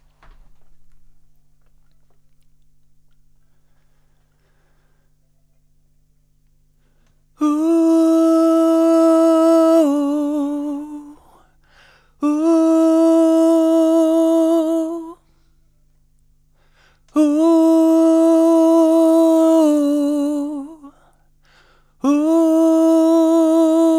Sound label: vocals